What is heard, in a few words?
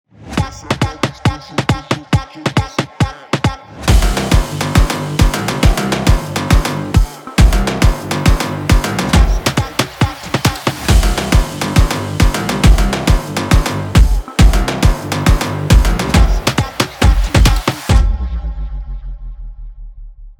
phonk music; tiktok instagram